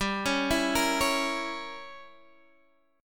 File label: G Diminished 7th, Guitar, Half Step Down